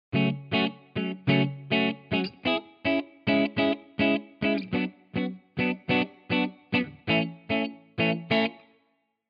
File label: les paul wet; chiqui audio